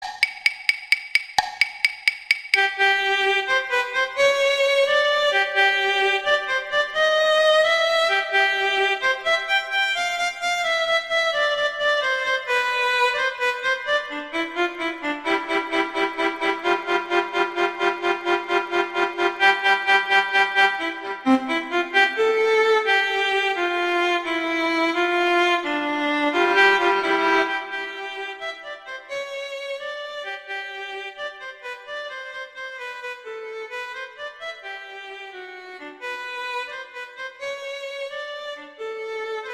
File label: Violin II; Allegretto; Duets; Mazas